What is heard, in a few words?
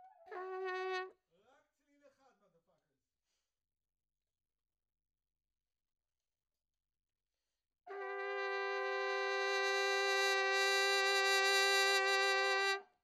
Trumpet